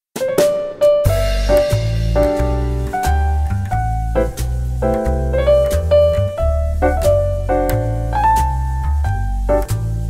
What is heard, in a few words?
Happy Birthday Slow Jazz